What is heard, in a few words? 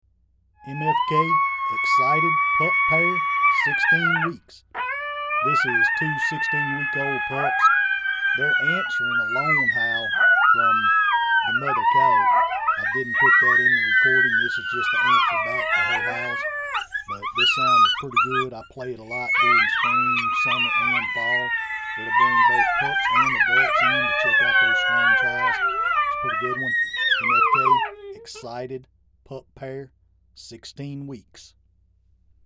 Excited Pup Pair 16wk; Game Calls